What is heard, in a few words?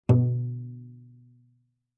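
Cello pizz fortissimo sul C B2; MIS Pitches - 2014; Strings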